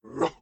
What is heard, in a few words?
dog indifferent